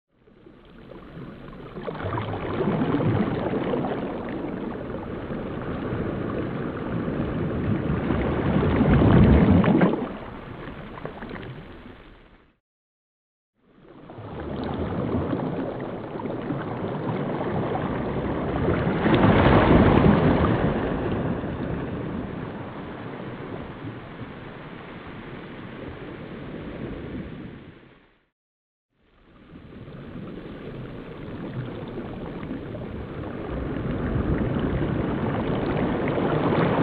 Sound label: Waves of the sea, ocean